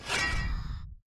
Prepare Attack; Raquel

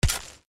Shovel Fail

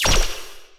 Energy Gain

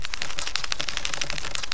Spider Run Loop; gamerip; Zombie

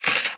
Impaler Earth Out

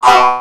Male Hurt Robot; gamerip; Mars Sortie

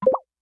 Quest Gain; hello kitty friends match; android

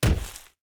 Hero Land Hard

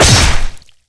Big Axe

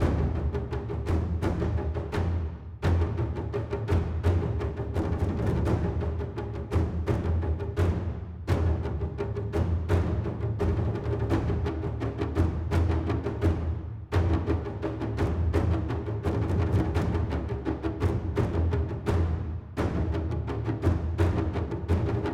Colosseum Intensity

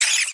Rat Attack

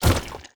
Honey Pillar Hit

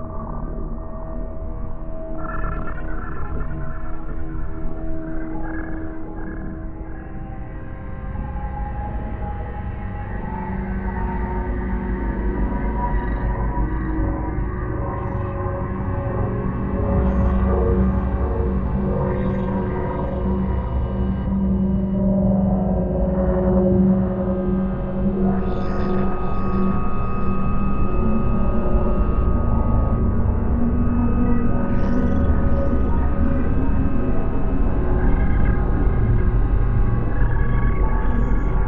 Atmosphere

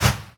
Javelin Sentry Shoot; Hollow Knight; Ruin Flying Javelin Sentry Shoot

